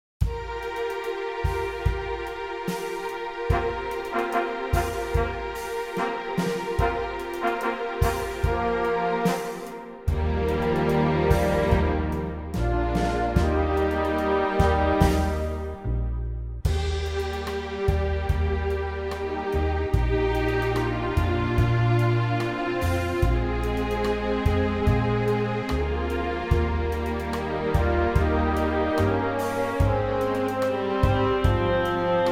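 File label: slow orchestral Eb, backing tracks for male vocals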